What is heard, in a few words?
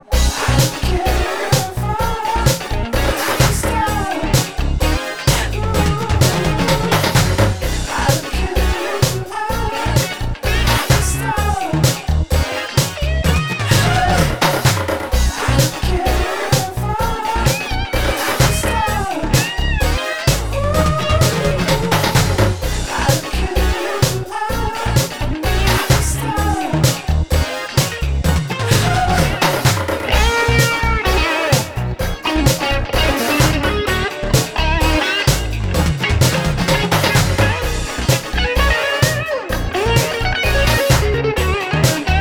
chorus with Solo 128 beats